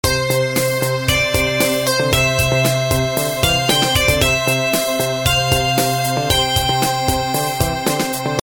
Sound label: midi